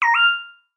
button

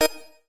button

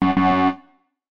button

